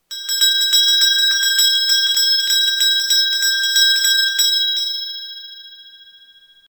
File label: Bell